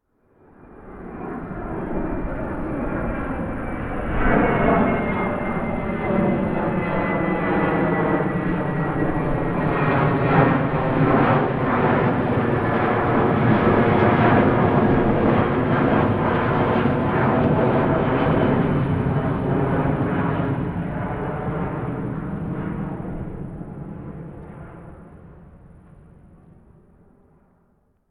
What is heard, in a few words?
airplane flyby; Golden Crayon Sounds